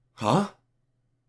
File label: Male Gasp